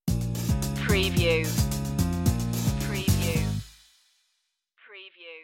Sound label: thumbs up island stinger